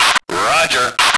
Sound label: radio; lmctf; andromeda